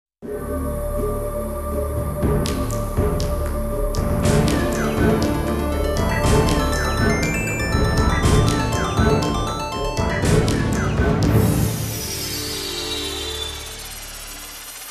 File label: Instrumental